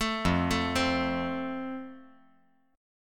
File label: F Major